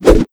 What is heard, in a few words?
knife deploy; crowbar